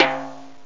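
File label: low timbale; gmplay; drums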